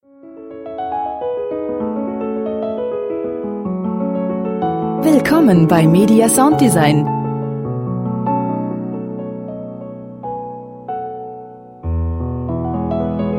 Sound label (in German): klassik